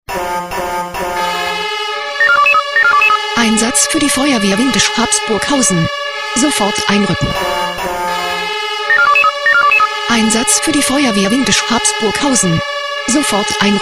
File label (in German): SMS Alarmierungstone; FW Windisch